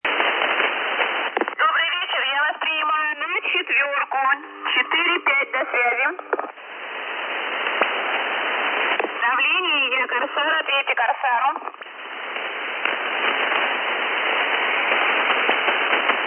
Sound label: websdr Davlenie 11360kHz